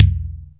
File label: Kick, Drum Machines Akai MPC-500; Toolkits; Kick TKit